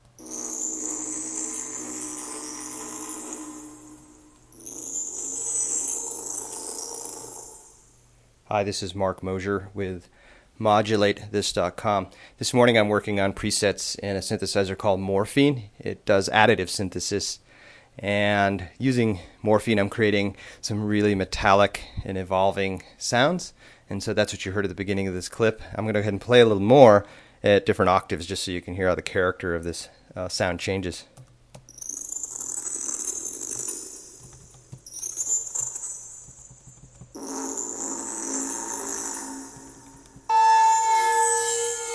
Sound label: sound design with Morphine virtual synthesizer